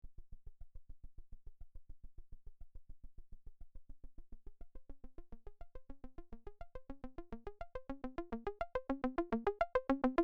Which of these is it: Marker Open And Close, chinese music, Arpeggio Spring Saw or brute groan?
Arpeggio Spring Saw